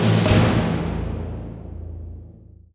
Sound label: Dialog User Attacked; rise of venice windows gamerip 2013